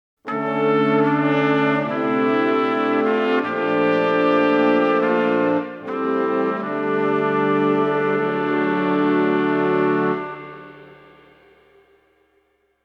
game over

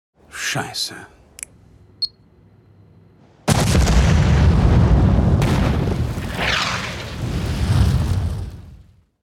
explosion skit

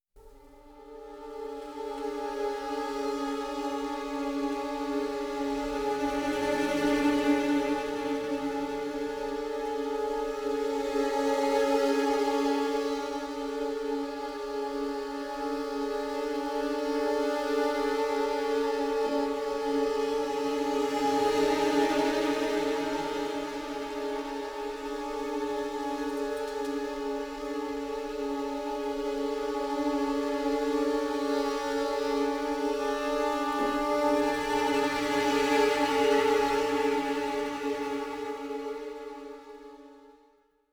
drone

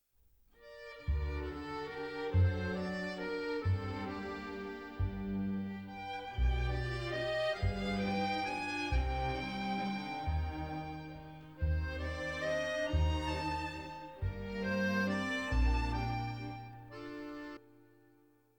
accordion

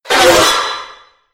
sparking

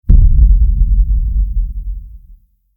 under hit complex short

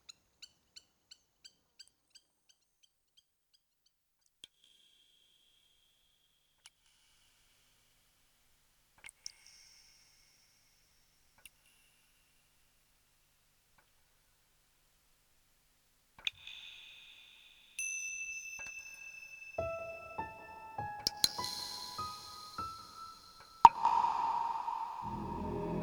strings

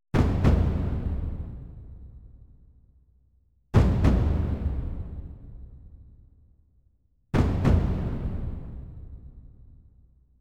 percussion only

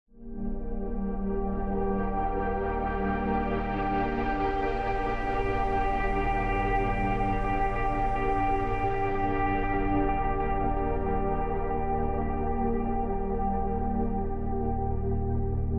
ambient

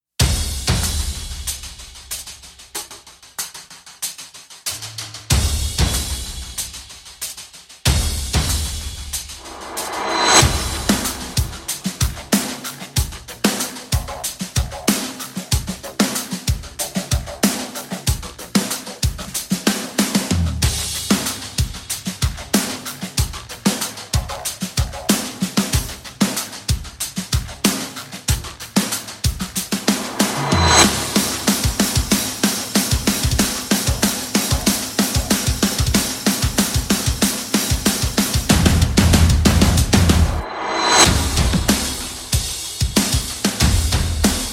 perc only

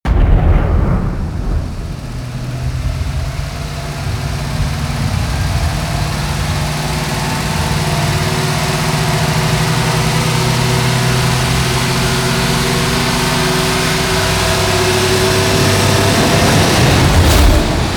riser thunder wheels

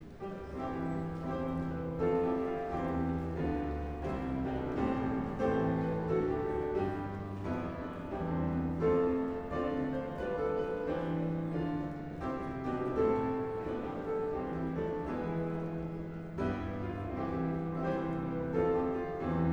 welsh male voice choir; melbourne

